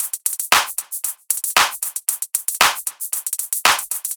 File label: Pitched, electro pop